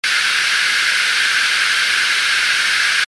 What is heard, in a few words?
noise